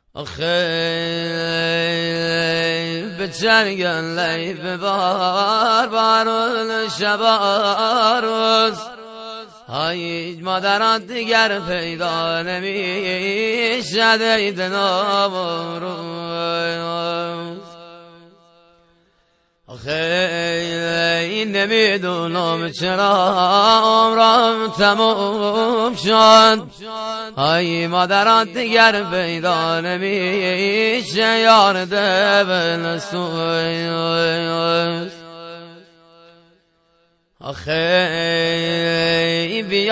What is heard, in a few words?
lori ghamgin khoram abadi